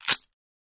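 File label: Plastic Crush Short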